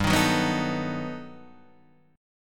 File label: G Augmented Major 9th, Guitar, Half Step Down